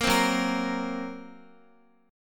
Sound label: Gb Major Flat 5th, Guitar; strummed; JGuitar